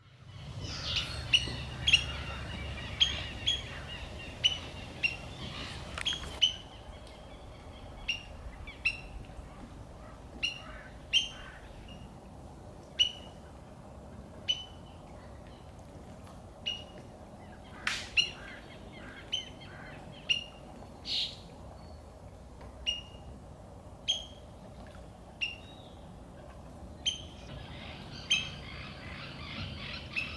merih nuoret, linnut; meriharakka

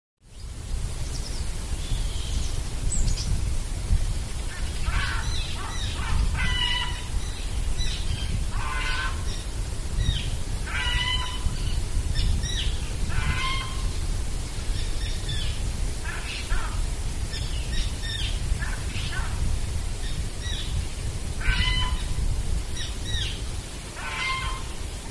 harmaa varoitus, linnut; harmaalokki; (+tiirat)

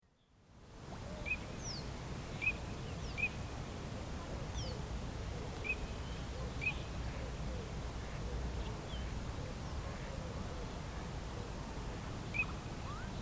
tavi; linnut

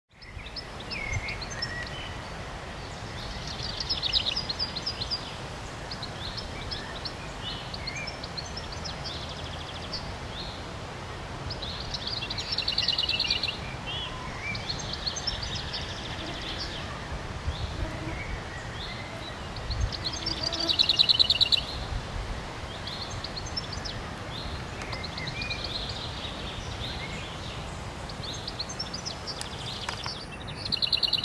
tikli ja hernekerttu; linnut

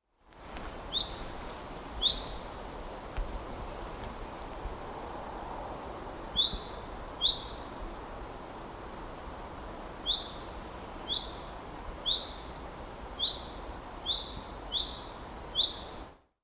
peipon kutsu; linnut

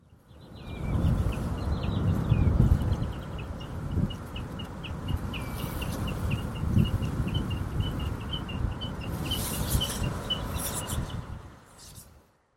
taivaanvuohi; linnut

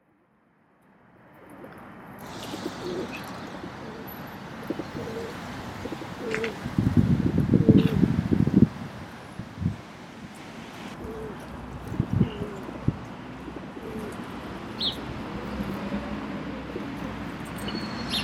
kesykyyhky; kutsu; linnut

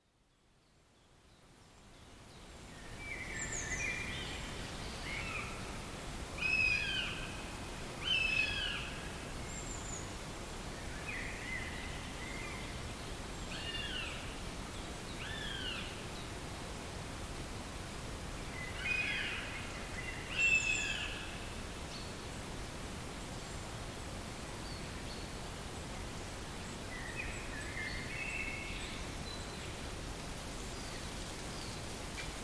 kanahaukka; Kanahaukalla on myös naukuvia ääniä kuten hiirihaukalla, ainakin tässä näytteessä kuitenkin korkeammalla äänitasolla; kyseessä voi olla myös poikasten ääntely pesällä; linnut

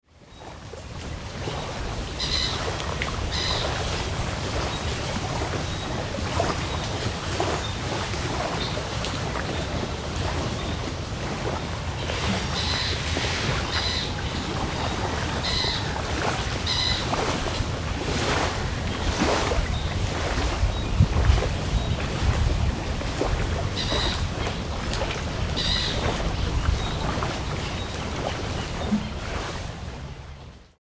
naurulokin kutsu; linnut

